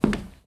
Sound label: Wood footstep